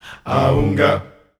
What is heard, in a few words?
G 120, Voices Of Africa Sung Phrases; A-unga